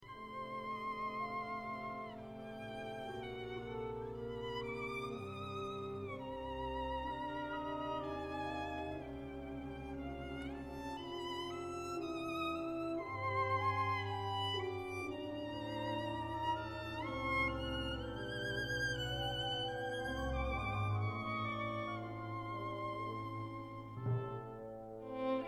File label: Violin Concerto; performed by David Borishansky and the Cornell Festival Chamber Orchestra; Excerpt 2